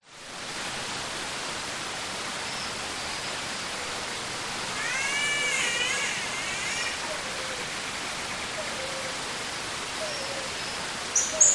nature waterfall rainforest birds green catbird distant voices people swimming